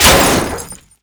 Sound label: physics suburban crash impact